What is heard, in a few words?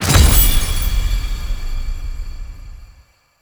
Endless UI Rewards; Duviri